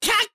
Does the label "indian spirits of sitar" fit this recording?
No